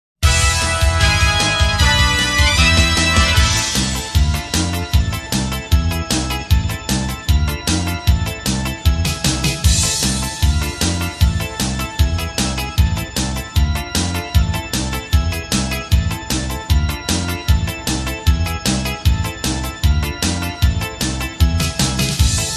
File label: minus lofi